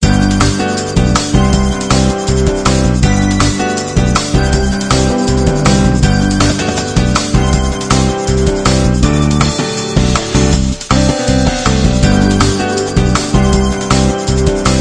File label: music